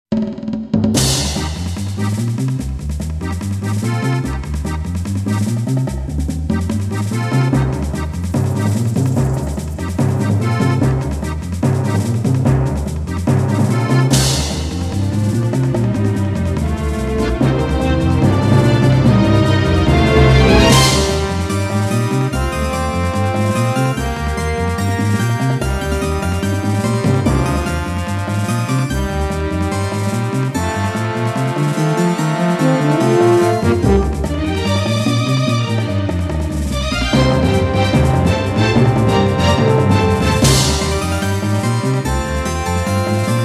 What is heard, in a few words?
vb rhythm